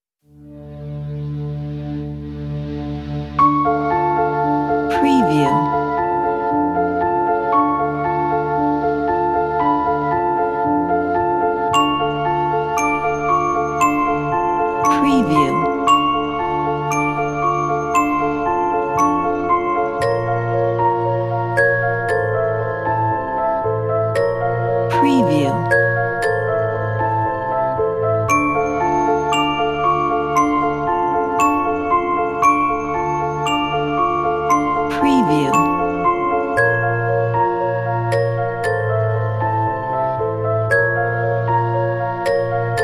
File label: horror of darkness, horror music